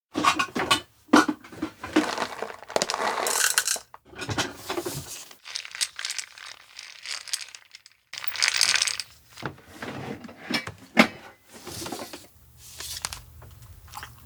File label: coffee brew mill dripper set; anemoi